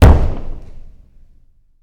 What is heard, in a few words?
Boxing Ring Bodyfall